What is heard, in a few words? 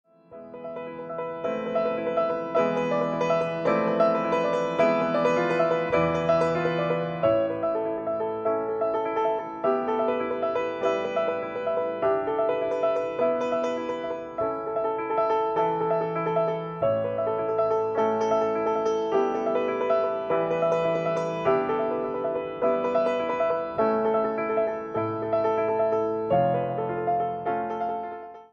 Tension Release